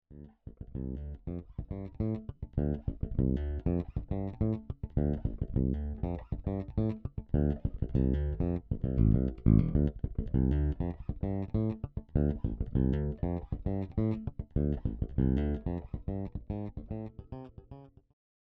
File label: Marquess Bass